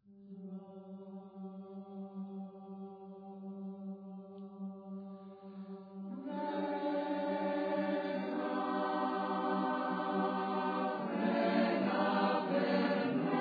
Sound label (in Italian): Inno alla BV dell Acero, canto